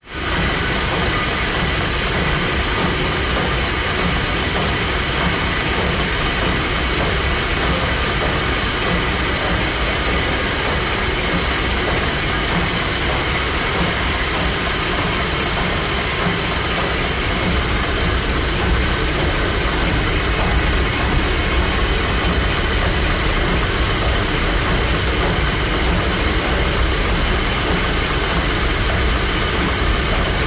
industrial motor; engines

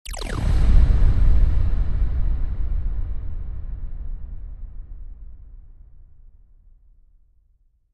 Wash Laser Fire Tremo

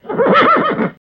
Horse Whinny, Neigh, Surprised

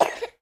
Baby Short Cough

